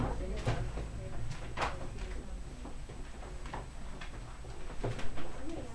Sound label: seashore